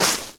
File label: stomp deep snow; Scrat